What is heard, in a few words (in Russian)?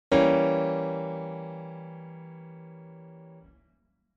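уменьшенный септаккорд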